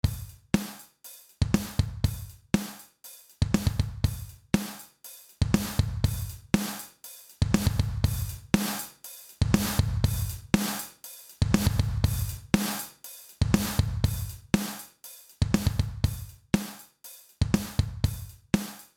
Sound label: more sustain